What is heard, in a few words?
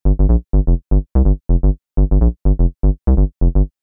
Vital Bass Techno House